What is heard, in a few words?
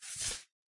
card slide